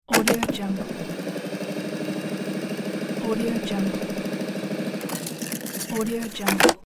analog tape recorder mechanism

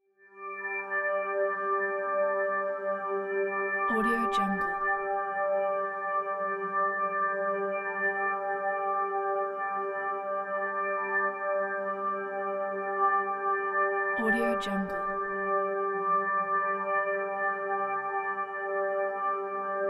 fairytail drone

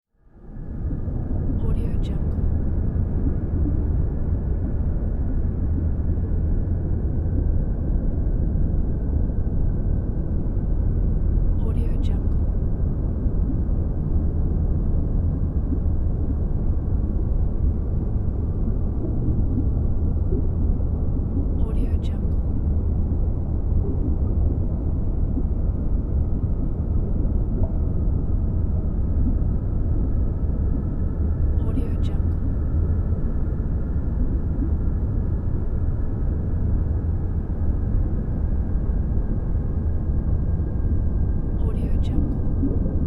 underwater ambience

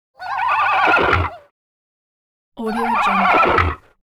magic horse and loon bird cooing whinnying

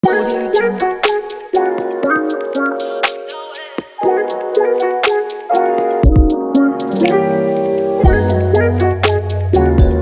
rb

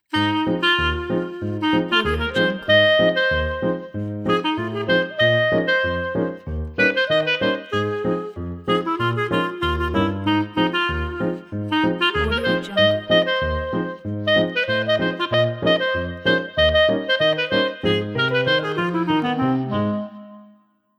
on the streets of new orleans again

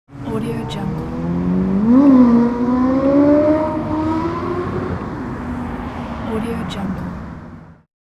sport motorcycle; zdravinjo

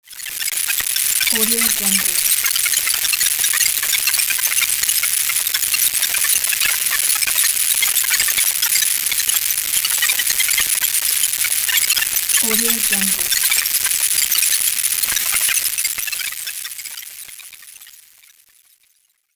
crack scifi

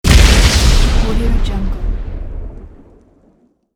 explosion blast

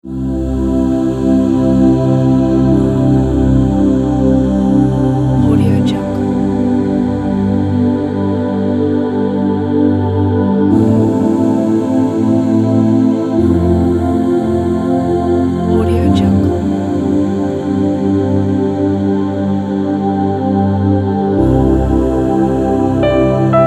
meditation; garybirdman

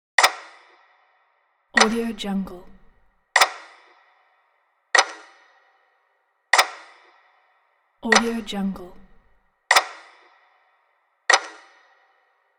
clock slowly passing time vibe

